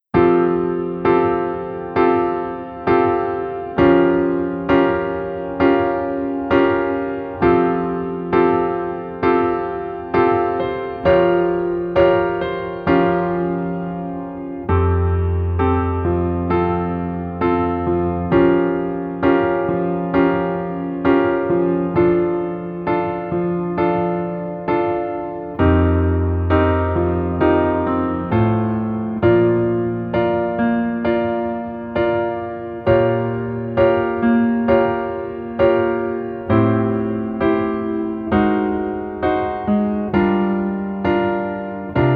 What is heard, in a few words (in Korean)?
Piano ver, 여자키